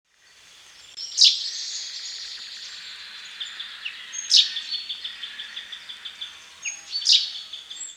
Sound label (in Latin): Fringilla coelebs; SETE